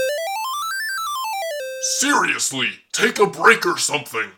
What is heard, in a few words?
jingle take a break